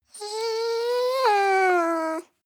dog whine low sigh; natur-tiere; hunde

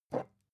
glass pick up smooth soft; objekte

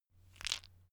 stone gravel clatter soft; natur-tiere; steine

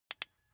car key button click signal fast

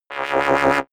sci-fi scanner wobble medium; energie

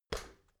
step ladder metal single; schritte

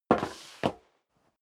step sneaker metal scuff; schritte

